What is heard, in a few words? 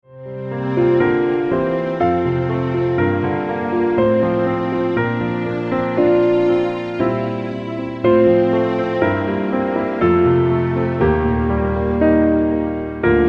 Lead Kindly Light